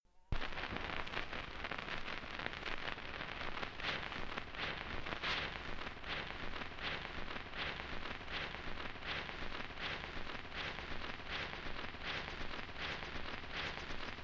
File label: recording noise; lindsay